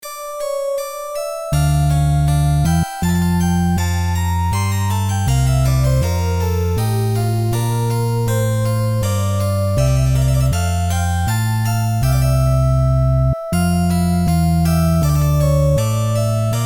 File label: Victorian Trio